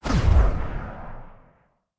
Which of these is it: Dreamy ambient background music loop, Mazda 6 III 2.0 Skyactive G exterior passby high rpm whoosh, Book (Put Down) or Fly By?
Fly By